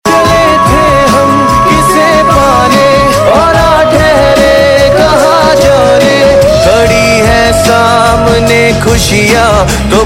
Hindi Ringtone

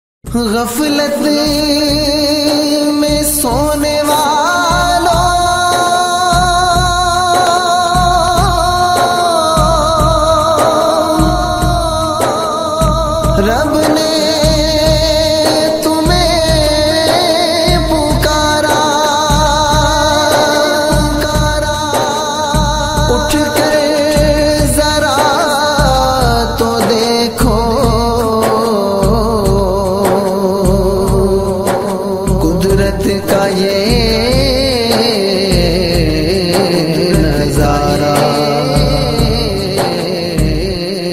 Naat Ringtone; Special Ramzan 2018

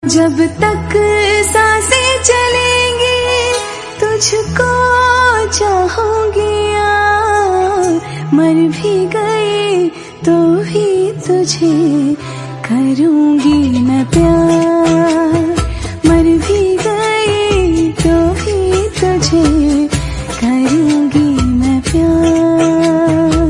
Female Version Ringtone

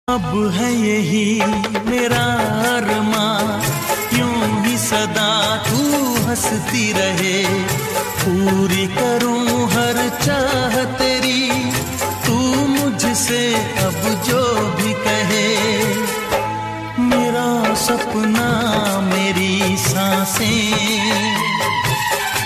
Love Song Ringtone

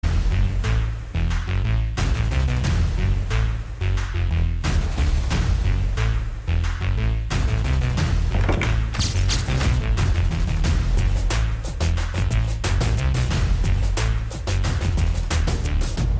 Background Music Ringtone

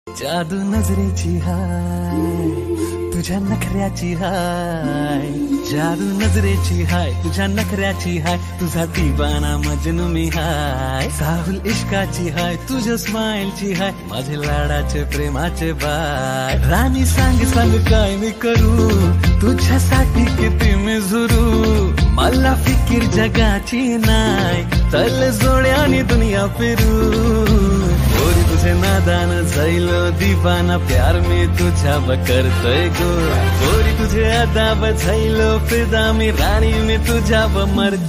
Marathi Ringtone

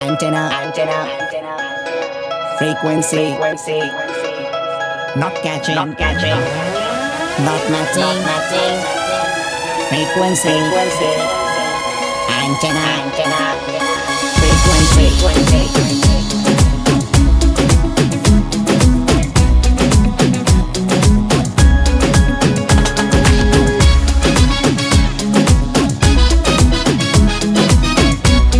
Remix Ringtone

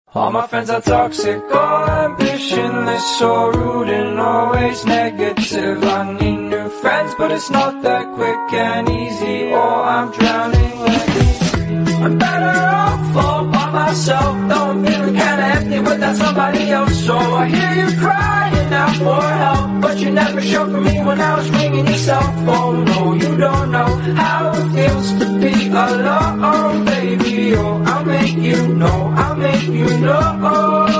Lofi Remix Ringtone

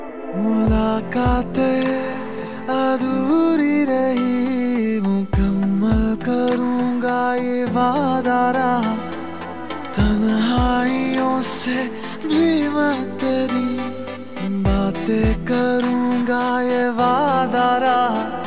New Hindi Song Ringtone

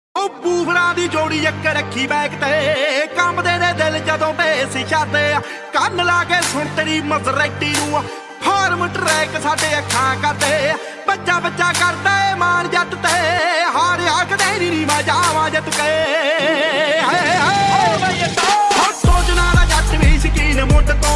New Punjabi Song Ringtone

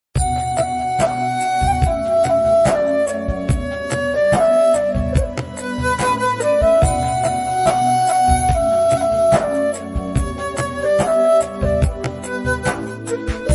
Tamil Movie Flute Ringtone; Minnale

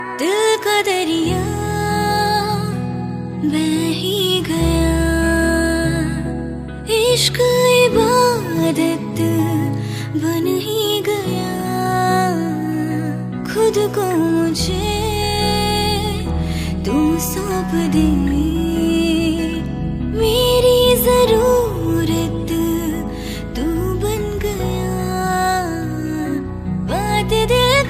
Female Version Ringtone

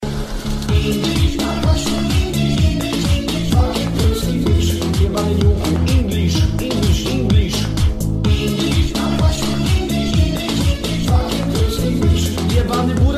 English Ringtone; ringtones

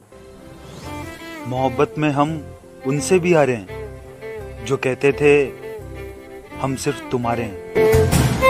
Sad Song Ringtone

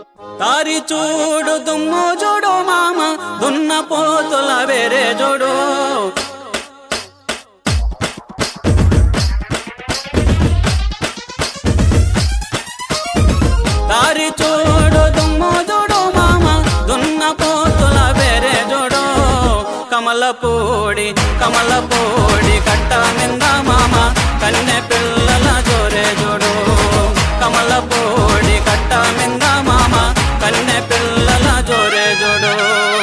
New Telugu Song Ringtone

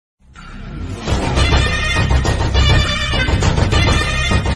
Message Bgm Ringtone

